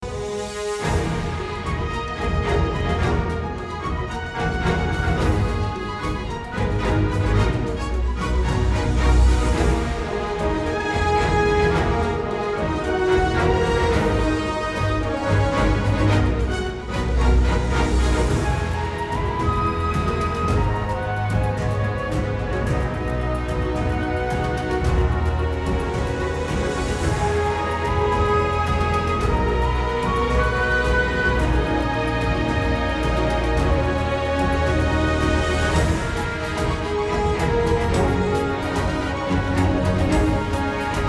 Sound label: raid lobby, bgm